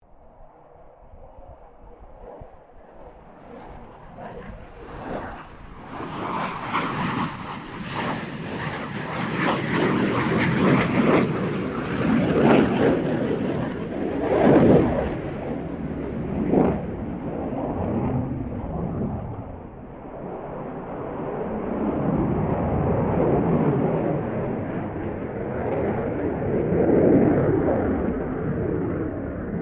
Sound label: AIRCRAFT FA-18 HORNET PASS; RAAF FA-18 Hornet; Airplanes